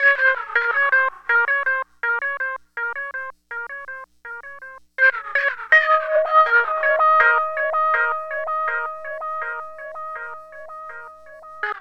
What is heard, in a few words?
SYN, Drum & Bass; Best Service ProSamples vol.03 - Drum & Bass 1; AKAI